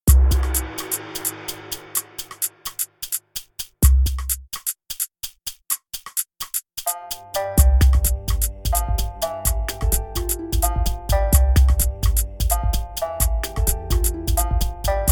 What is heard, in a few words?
Asian action